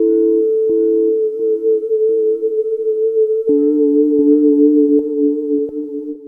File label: Ghost Bowl; Sonic Foundry (Sony Creative Software); Loop Noir